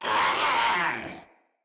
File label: Infested Runner Attack Call